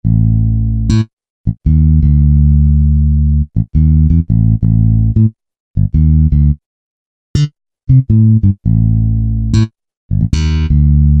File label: MM Bass, Roland SRX-07; Roland SRX-07 Ultimate Keys